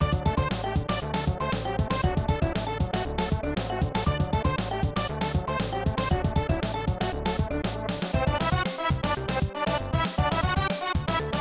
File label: End of Match (Win); J.League Excite Stage '95; SNES